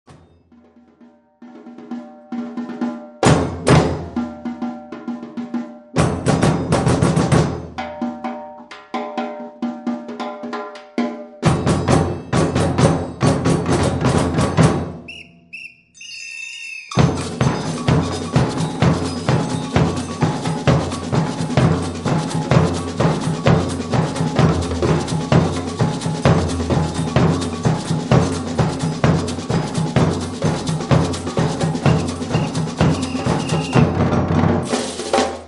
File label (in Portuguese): fanfarra